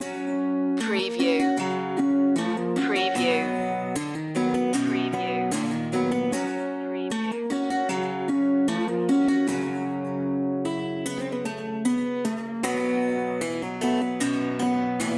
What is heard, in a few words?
wild west guitars